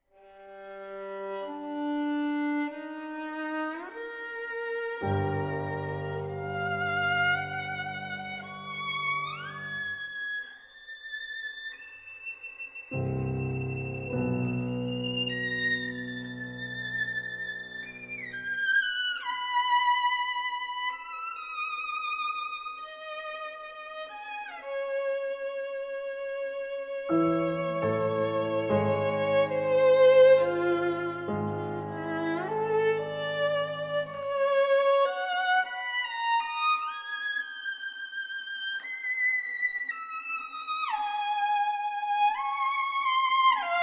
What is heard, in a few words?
sonata for violin and piano